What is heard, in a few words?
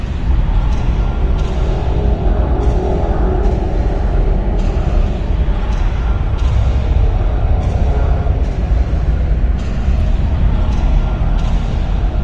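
ambient creepy ticking; hellhole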